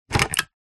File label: household vacuum cleaner dyson v7 stick attachement unclip remove